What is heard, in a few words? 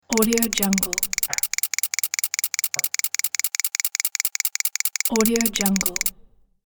data output device clicking